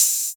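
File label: 808 open hi hat; Goldbaby Tape808 & Tape909; The Tape 808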